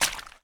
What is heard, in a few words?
Footstep Puddle